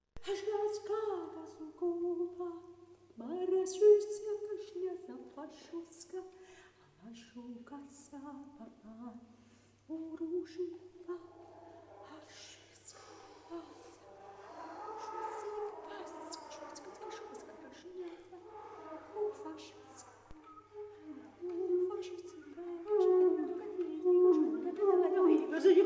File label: Improvisation Voice And Flute